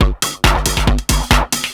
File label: percussion loops, Techno; Techno ID